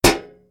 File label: hit Metal